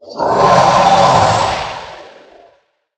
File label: Mana Giant Death